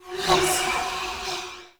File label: Generic Foley; Warframe; Choir